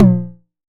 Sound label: TOMS 909; Wizoo - Platinum 24 Electronic; CHR TOMS 909